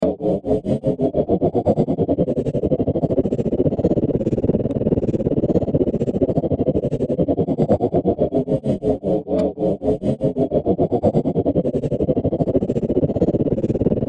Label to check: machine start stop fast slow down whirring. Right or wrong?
Right